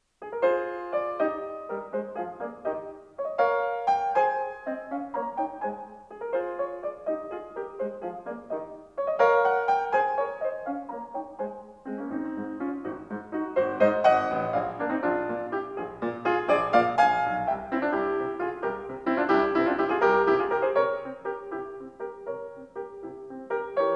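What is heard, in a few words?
Piano Sonata No. 12 in A flat major; Wilhelm Kempff; Beethoven; Piano Sonatas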